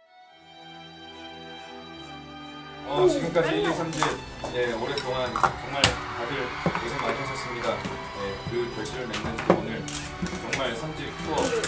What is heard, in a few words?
Live & Acoustic